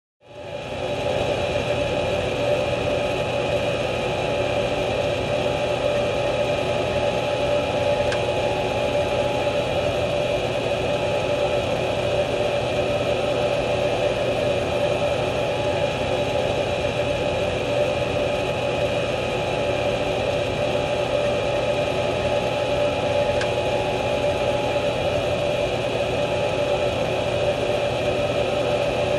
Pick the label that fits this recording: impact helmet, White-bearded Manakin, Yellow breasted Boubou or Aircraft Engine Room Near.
Aircraft Engine Room Near